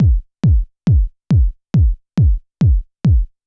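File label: bass drum, Techno 138 BPM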